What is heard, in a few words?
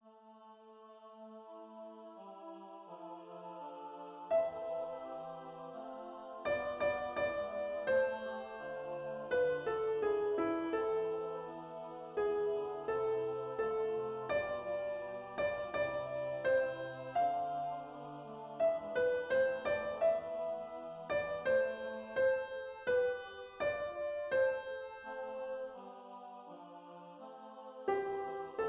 SOP, Diffusa est gratia; Nanino